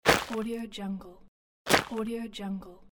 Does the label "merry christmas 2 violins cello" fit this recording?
No